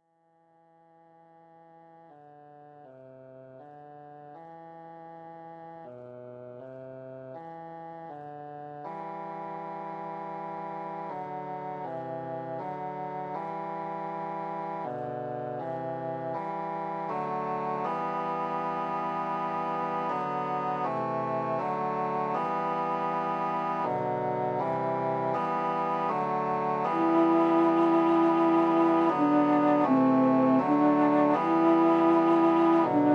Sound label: canon in e minor; juvenilia; melodyassistant